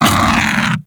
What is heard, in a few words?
Undazoa Vocal Pain; Warframe; Hunting Critters; Characters